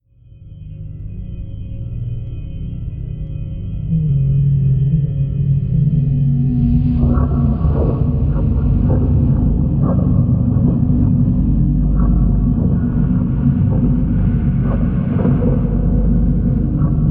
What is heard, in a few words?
hollow noises